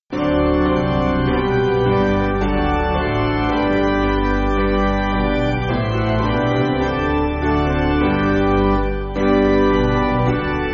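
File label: Piano, church backing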